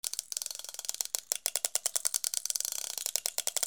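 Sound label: percussion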